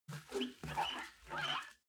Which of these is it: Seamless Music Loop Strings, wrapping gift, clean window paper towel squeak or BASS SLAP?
clean window paper towel squeak